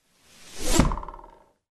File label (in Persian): برخورد تیر به چوب